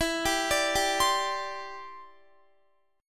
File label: E Minor 7th Sharp 5th, down, Guitar; arpeggiated